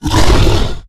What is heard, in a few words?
Exertion Light; Evolve; Goliath